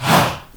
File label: HA, SHOUT; Spectrasonics; Heart of Africa 1; CH. SHOUT1